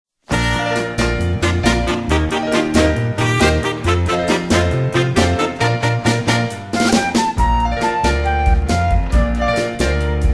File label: Instrumental